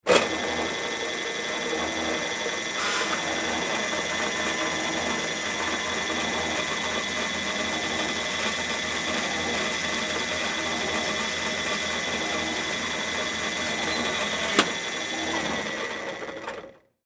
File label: Band Saw On Run Off; Industry; Saws; Machines; Blastwave